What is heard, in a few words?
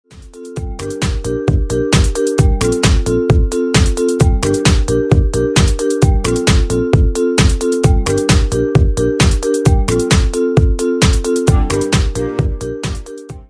Instrumental